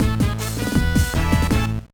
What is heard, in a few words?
Jingle, gba; Backyard Baseball 2007